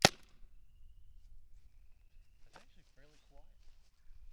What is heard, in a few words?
vm empty from side clip, guns; vm-68